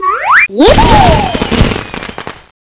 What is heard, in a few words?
scene appear; cstrike; Teddy CSO